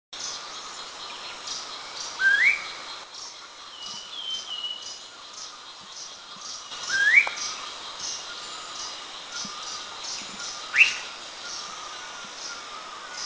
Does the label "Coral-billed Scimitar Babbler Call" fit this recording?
Yes